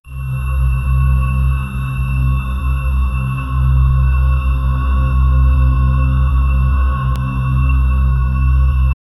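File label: sound a sustained, dissonant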